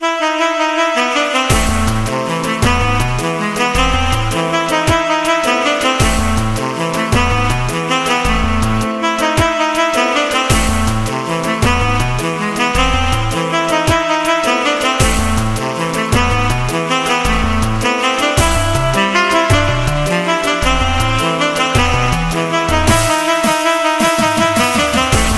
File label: reggae; horace ps4 switch windows xbox one gamerip 2019; beefoven